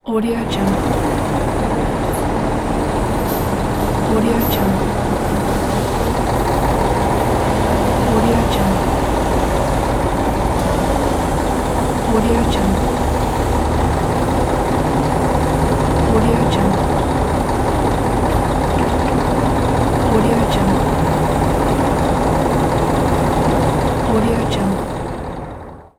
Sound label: construction crane